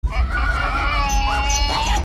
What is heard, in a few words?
animals grunting